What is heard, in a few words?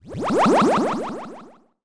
magic